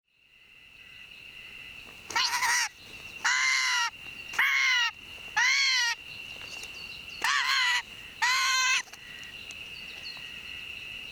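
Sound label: White's Thrush; chichibu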